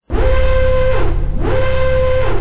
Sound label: alert